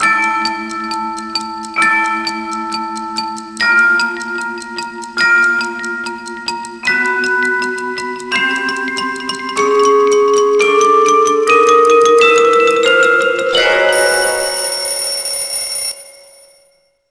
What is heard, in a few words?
English Class End; bully scholarship edition